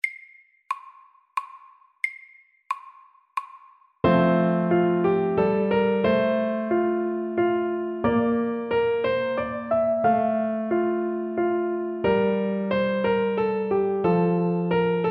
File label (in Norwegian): Menuett SAKTE